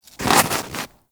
Armadillo Move Snow

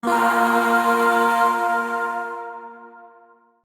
Shawzin Chromatic Chord; Warframe; Zariman Void Shawzin

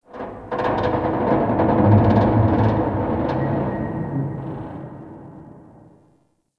Stadium Metal Creaks Medium; Ambience; Warframe; Nineteen Ninety Nine

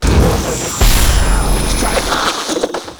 Shared Fate Ability Cast; Infested Drillbit; Warframe; Infested Lich

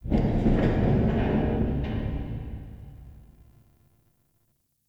Cave Mults Metal Tension; Ambience; Warframe; Stalker

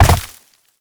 Land Vegetation; Movement; Warframe; Uriel

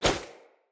Bow Melee Arrow Whoosh; Weapons; Player; Bows; Grand Father Bow

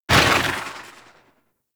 Infested Swarm Rifle 2D Tail; Shock Swarm Rifle; Weapons

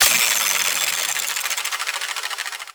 Pistol Skin Reload Spin; Warframe; Weapons; Capstan Pistol Skin

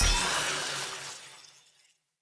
Short Sword Recall Button Push; Weapons; Player

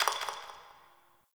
Brick Crumble; Ambience; Warframe; Nineteen Ninety Nine

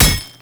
Ghoul Devourer Melee Impact

